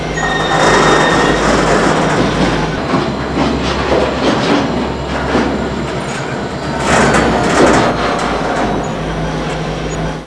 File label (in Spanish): acople, ccbrailroad